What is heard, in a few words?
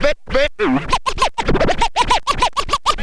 scratch short, Vinyl scratches